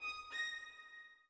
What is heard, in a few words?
orbit violin; Sky Children of the Light gamerip